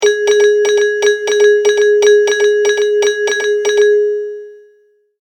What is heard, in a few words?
Multimedia Tone-Thinking/Processing; McKinney Sound